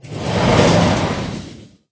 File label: Lane train